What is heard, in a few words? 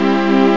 green maj